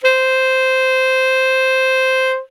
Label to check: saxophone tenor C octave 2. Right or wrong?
Right